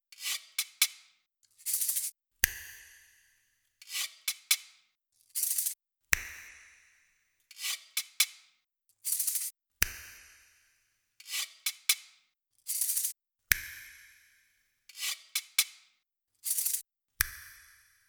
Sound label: Percussions